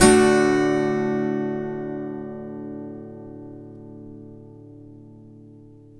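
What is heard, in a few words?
major D up, blues guitar; ILIO; Fretworks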